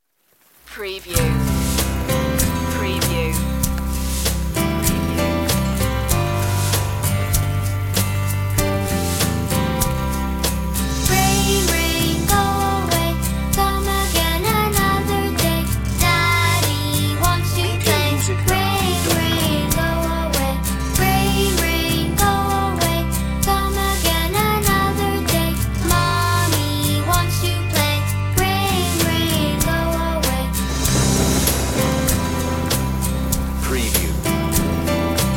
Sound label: rain rain child solo vocal